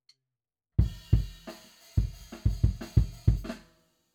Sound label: Kick IN